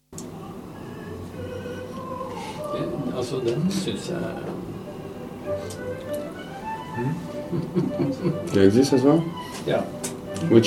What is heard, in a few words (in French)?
ambiance cave; chateauneuf